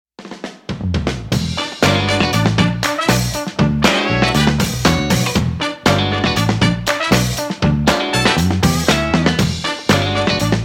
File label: Eras 70s